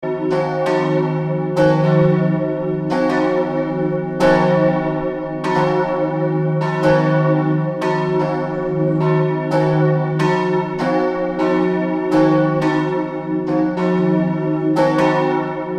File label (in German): glocken von steinwedel